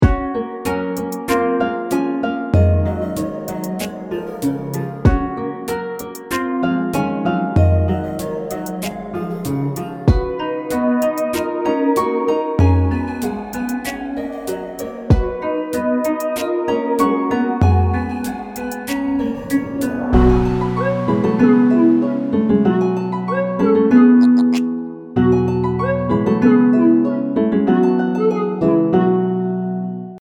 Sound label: peaceful ville; peacefull ville